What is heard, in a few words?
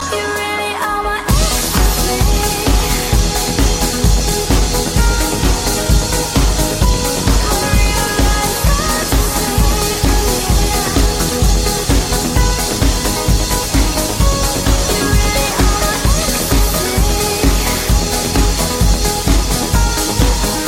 Ecstasy Trance Drum